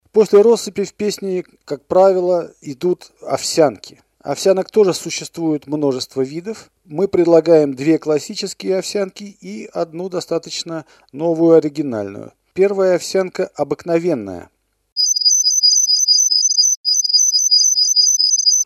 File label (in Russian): ovsyanka, canary